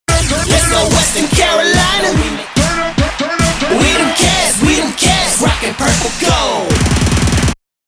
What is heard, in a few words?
8 count rap